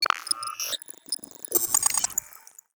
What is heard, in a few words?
bionic uavs tech